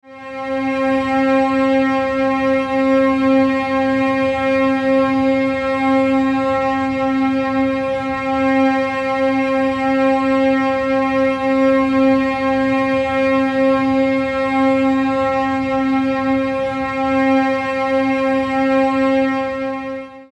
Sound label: Medium C, tones